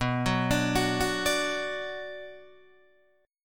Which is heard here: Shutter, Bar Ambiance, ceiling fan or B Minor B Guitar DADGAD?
B Minor B Guitar DADGAD